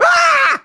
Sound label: Scared; Melonbra